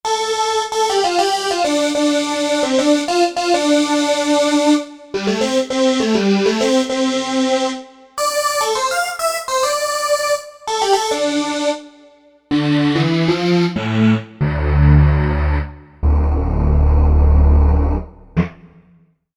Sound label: Farelite Voice, synth